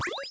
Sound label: Jump